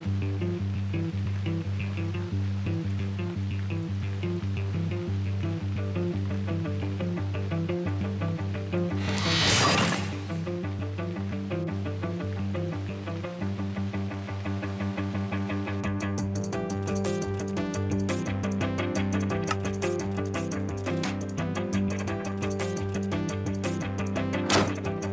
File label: Guitar sketch (delayed drums); mcsm music; Netflix